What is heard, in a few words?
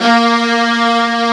A#1, CELLO SECT; AKAI S6000 CD-ROM - Volume 1; Strings